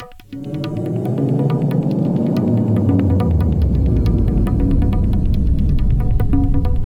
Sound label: Tabla, 140 BPM; Zero-G; Pure Tabla